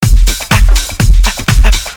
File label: depressing rock music